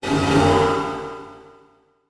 Transition Metallic Hit

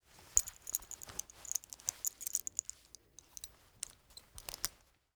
Clothes Belt Putting On; Foley

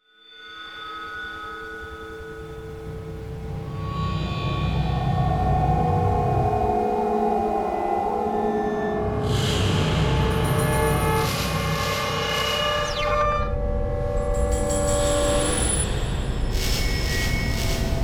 Spooky Delay Hits; Imaging Elements; Module Production Element Title Imaging

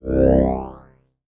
Multimedia Internet CD-Rom Flash Animation

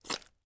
Liquid Mud Suction

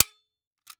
Industry Tool Gun Staple Gun Shot Single

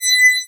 Beep High Pitch; Multimedia Internet CD-Rom Flash

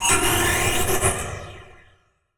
Transition Feedback Warble; Production Element

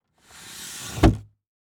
Car Monte Carlo Open Sunroof Cover; transportation

